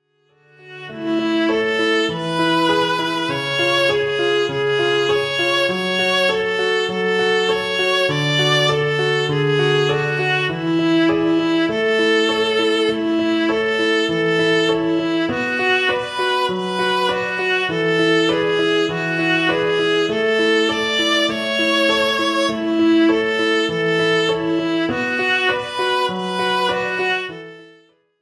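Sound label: viola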